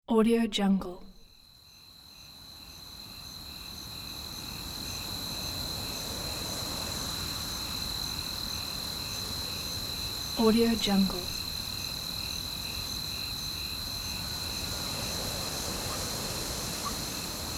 night ambience

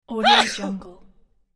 cough and sneeze from man; sound ideas

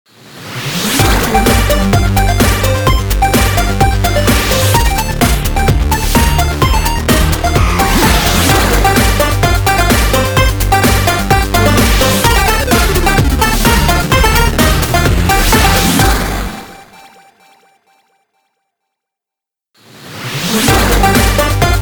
8bit chiptune arcade logo pack; petruality

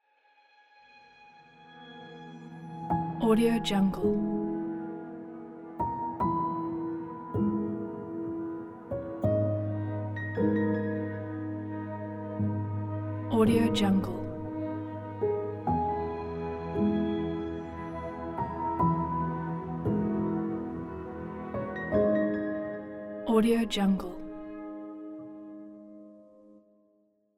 sad fragile piano ident

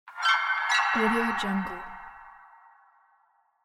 suspense accent

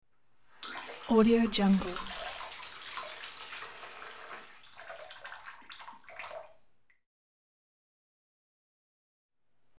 water faucet run and turn off

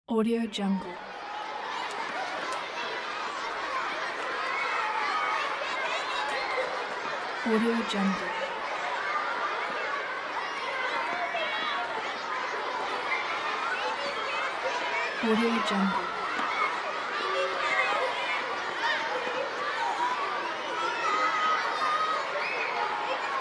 crowd kids playing

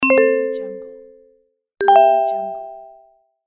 notification

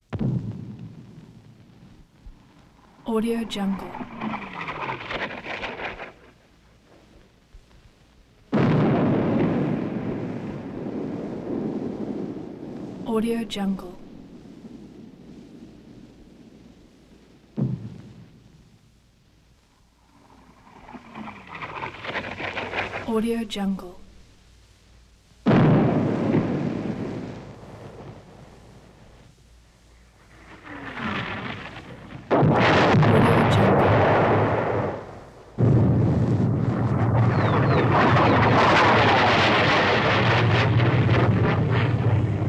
mortar fire

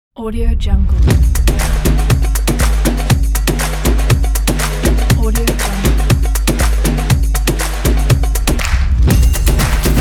hybrid ethnic percussion logo

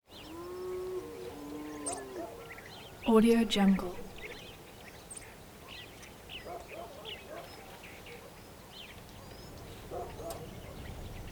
easter morning exterior constant early morning

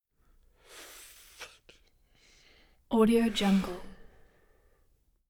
smoke joint deep inhales exhale blow out smoke

